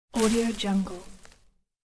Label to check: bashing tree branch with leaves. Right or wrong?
Right